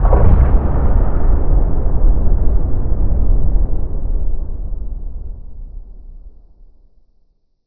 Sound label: structural explosion distant